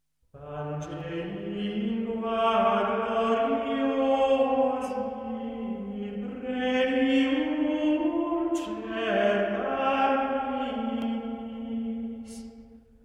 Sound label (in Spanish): Canto gregoriano; Recursos